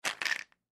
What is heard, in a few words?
Vitamin Bottle Pour Out Pills; Pouring; Blastwave; Foley